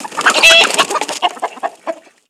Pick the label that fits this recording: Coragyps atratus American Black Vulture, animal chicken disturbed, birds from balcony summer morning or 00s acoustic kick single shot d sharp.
animal chicken disturbed